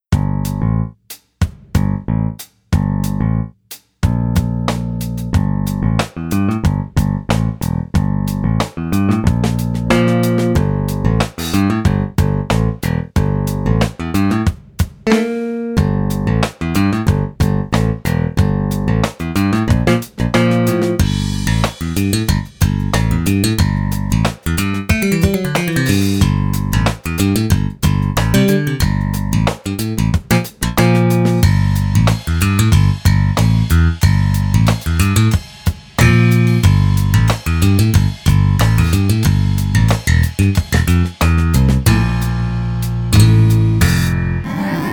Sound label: grate bass; borth audio